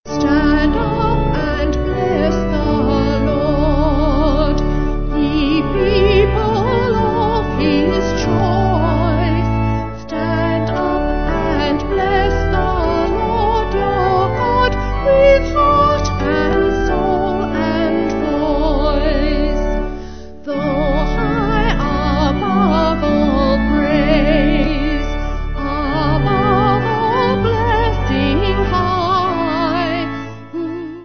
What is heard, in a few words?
Vocal Organ